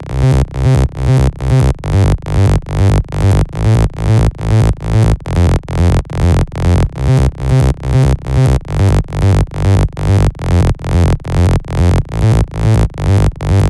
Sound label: 140BPM C; Vengeance - Essential Clubsounds Vol.4